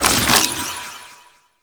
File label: Shrine Assemble Frag Collected; Warframe; Duviri Murmur